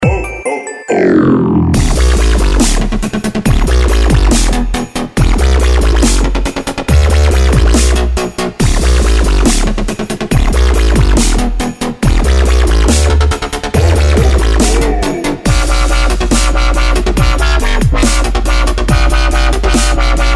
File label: jinglestep